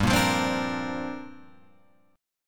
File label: G Minor 11th, chord, Guitar Open F; Gm11 strummed; JGuitar